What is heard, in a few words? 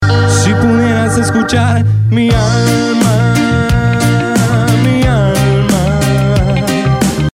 Mozambique Reggae